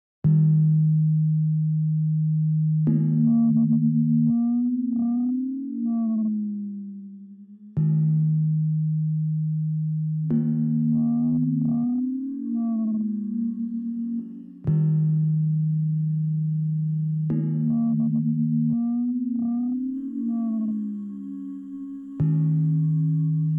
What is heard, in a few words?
blue waves